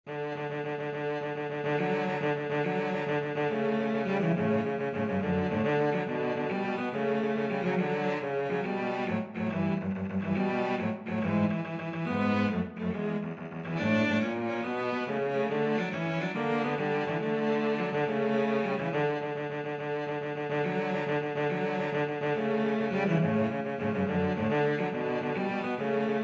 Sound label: cello duet